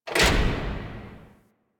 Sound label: Worker Lever Push; Warframe; Venus Open World; Venus Solaris United